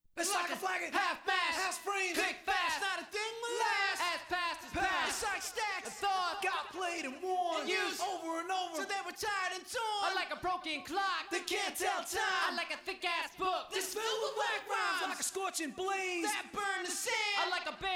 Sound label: acapella